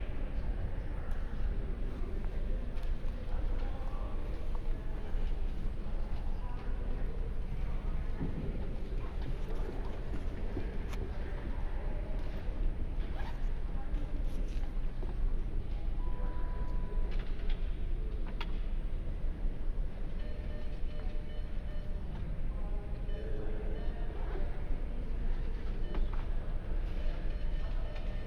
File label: Hospital Lobby